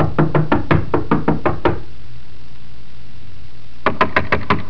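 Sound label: multi knock, house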